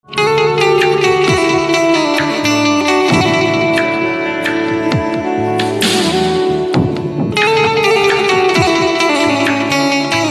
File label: Banjo Instrumental Ringtone